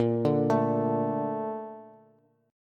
Bb Major 7th, Bb, down, Bass; BbM7 arpeggiated; JGuitar

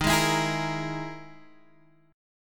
E Major 7th Flat 5th D sharp

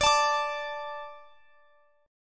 D 5th D, down, Guitar, Standard; D5 strummed; capo=3

